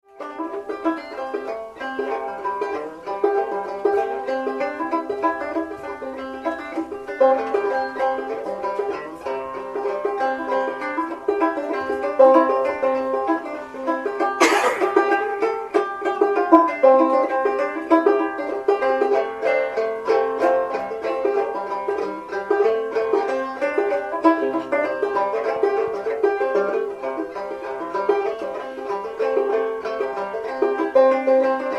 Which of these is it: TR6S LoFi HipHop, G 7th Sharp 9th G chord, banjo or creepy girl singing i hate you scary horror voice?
banjo